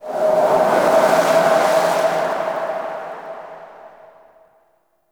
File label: Exterior Forest Wind; Duviri